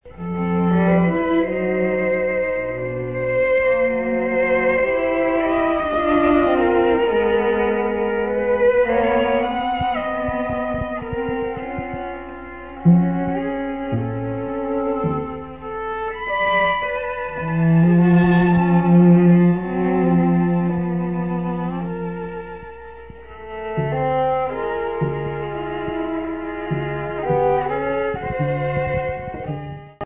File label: trio